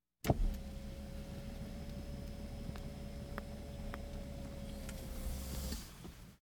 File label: Automobiles various